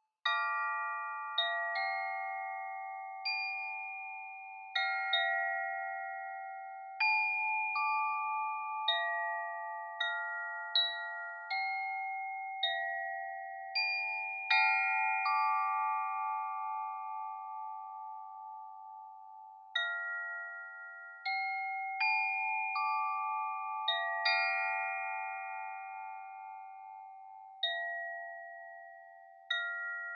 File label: KOSHI CHIME